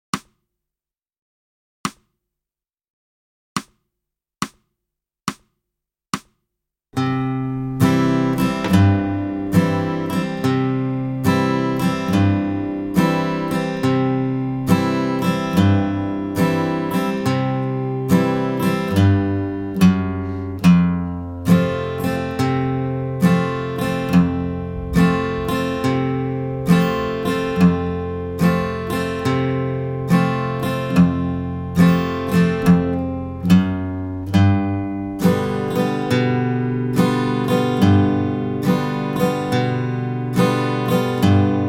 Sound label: Backingtrack Principal 70bpm, country